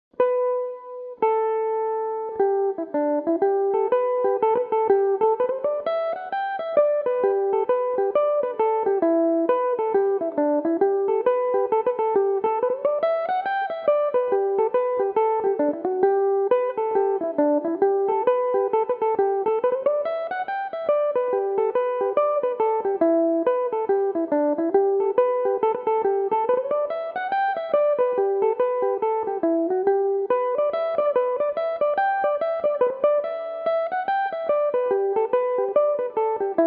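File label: little stack of wheat G major, learn some tunes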